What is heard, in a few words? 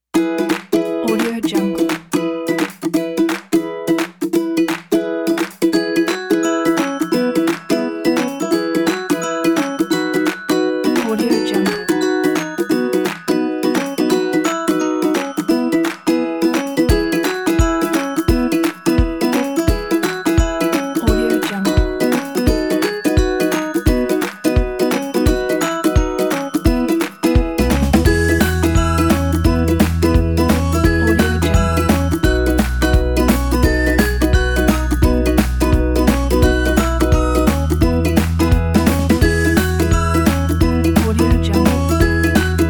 children